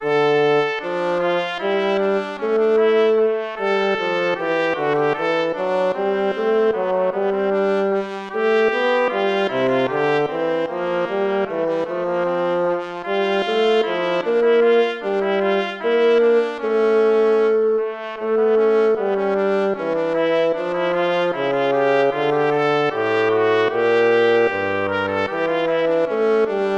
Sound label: air, hornpipe